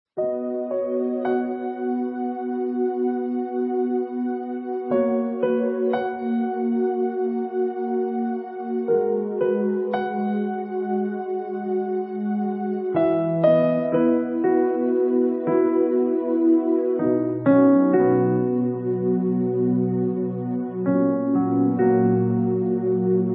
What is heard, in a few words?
Piano Soaking Music Instrumental Music; 等候神音樂; 靈修音樂; 放鬆舒眠; 安靜音樂; Worship